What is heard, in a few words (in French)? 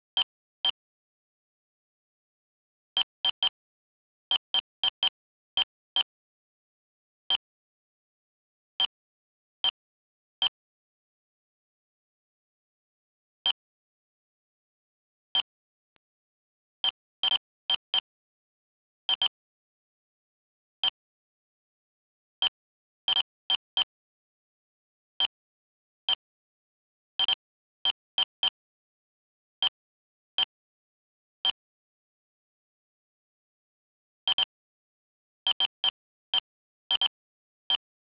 faible radioactivite, geiger; radiocol